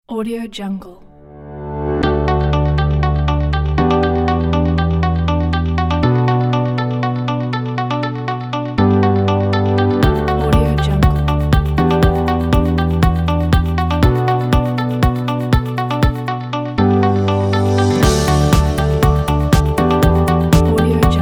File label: uplifting corporate motivational